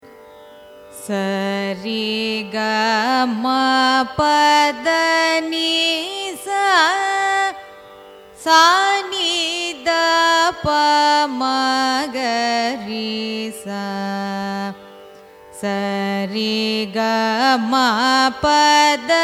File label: raga karaharapriya arohanam avarohanam; carnatic music